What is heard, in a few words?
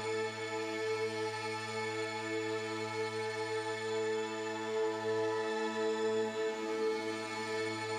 Drone; stereo toolkit samples; Non Tempo Loops